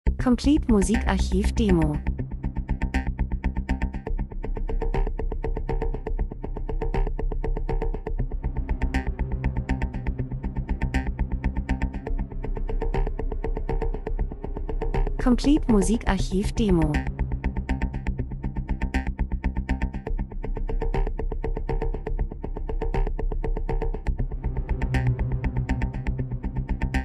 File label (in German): drums, rhythmus; Rhythmus einfach Sequenz gleichförmig spannend Bass Menschen Zeitgeschehen Reisefilm Dokumentation